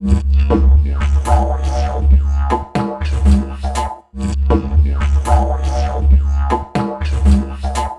Dig Loop, Ethnic Instruments; Sonic Foundry (Sony Creative Software); The Electronic Music Manuscript Collection

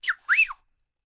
WHISTL, World Voices, ANDES; Spectrasonics Vocal Planet

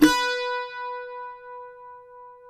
cuatro; Spectrasonics; Hans Zimmer Guitars VOL-2

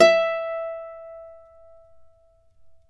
Celtic Harp, Plucked Strings

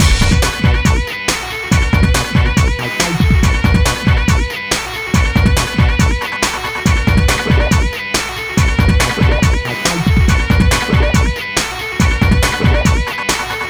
Hardcore Breakbeat, 140 Bmaj; Big Fish Audio; Hardcore Breakbeat Action; Goliath

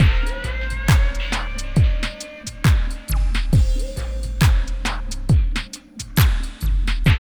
LOOP, Tekno Industrial; East West

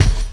BD, Dark Side Of TripHop

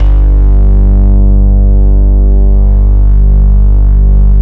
D50 BASS; East West; Phat & Phunky

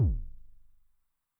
Bass Drum PItch Depth, 909; classic machines; BD - BASS DRUM

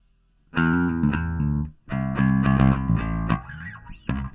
Bass, Indie Rock

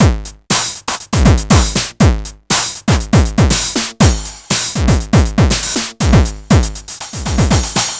909 DIST; Wizoo - Platinum 24 Electronic; DEMO KIT

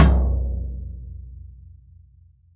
FT4 MF, VELO TOM; VELO TOM 1

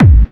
Clubby Kicks, Bassdrums

